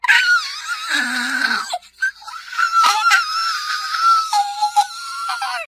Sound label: screech